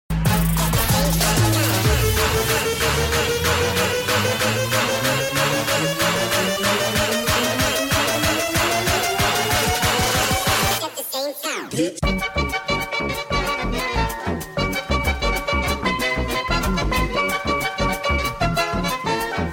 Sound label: bass drop